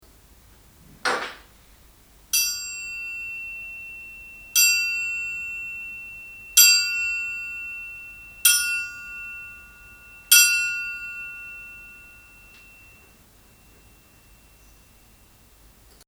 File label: Triangle; DiGiGrid IOX